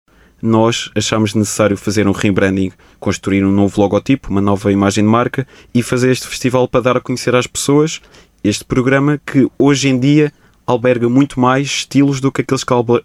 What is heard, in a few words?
Hip Hop